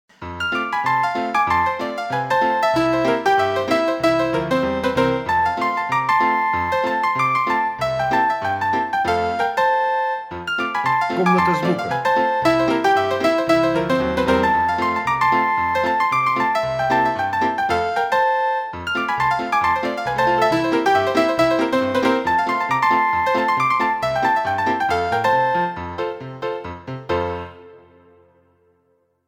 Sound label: Ragtime